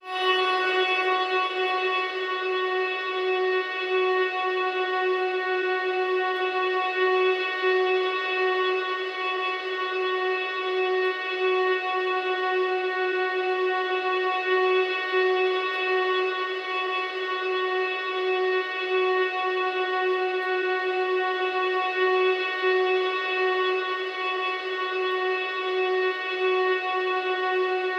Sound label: Ambience F#; Cymatics - Odyssey